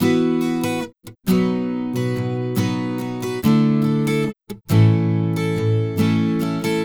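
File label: Acoustic Pop Guitar 140BPM E major; Vengeance - Essential Clubsounds Vol.4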